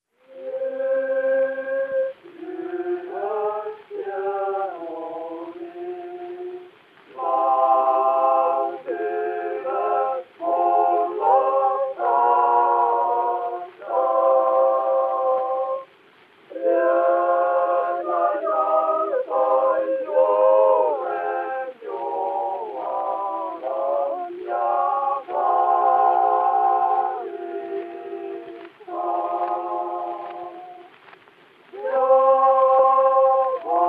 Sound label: Gramophone